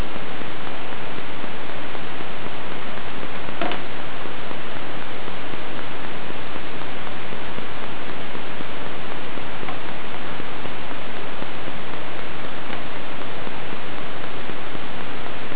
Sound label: creak